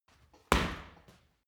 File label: punching bag kick hard